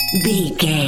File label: chime bell